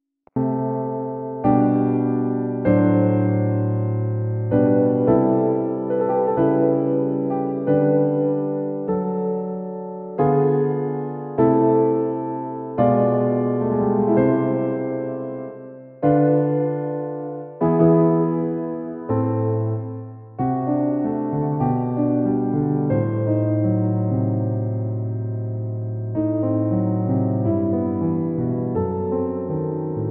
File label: Yamaha MX61 grand piano